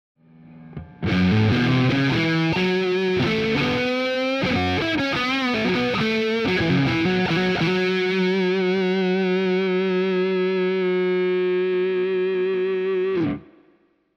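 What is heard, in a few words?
Strat Blade Neck, guitar pickup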